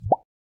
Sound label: Email Sent; LG